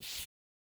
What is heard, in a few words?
Soft Slide Medium